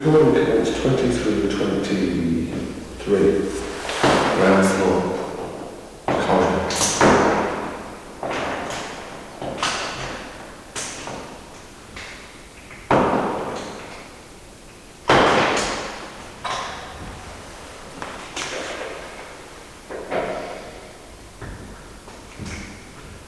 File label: heavy foot; School Birkenhead